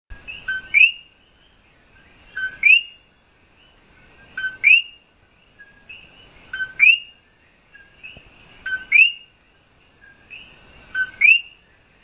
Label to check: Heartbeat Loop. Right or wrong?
Wrong